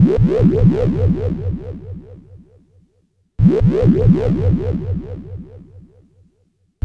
NRG SFX, Trance

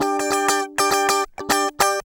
GUI 100 Bpm, Roland

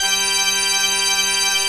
PIPE ORGAN

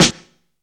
lazy, snares; Zero-G; Funky Elements

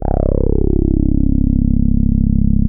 TAURUS E2, synthesizer

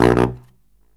Killer Brass Riffs; USB Soundscan vol.29 - Killer Brass Riffs; AKAI; BARYT

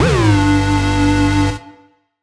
Siren Horn down, Ramp Down, G; future rave

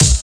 GRIT KICK; Drums In Your FACE!; BONUSKIK

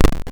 Crushed Perc, bitcrushed; musicradar

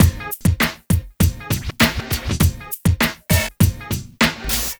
Club Tip, R&B Drums; Sonic Foundry (Sony Creative Software)

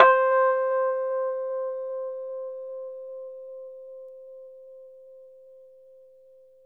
Rhodes 73 Slow, C; E-MU Producer Series Vol. 5 – 3-D Audio Collection; 3DRhodes

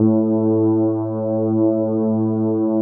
WAVE PAD

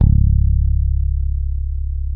SADWSKY MUTE, Bass; Sampleheads; Will Lee Bass Library; SADWSKY5MUTE

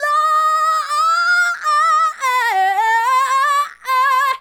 Female Solo, Hip-Hop and RnB Vocals

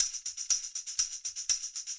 120 tamb; Top Ends; Zero-G; Monster Beats

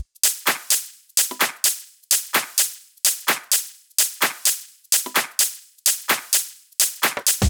Loops 128BPM, Drumloops

